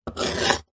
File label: foley wood scratch with knife blade tip single short